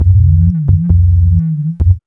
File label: B-LOOP